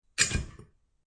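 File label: Mixer Lid Close; Ice Shaker Lid Close; Closing a metal ice shaker; Drink Mixing Sound Effects; Sound Effects:Bars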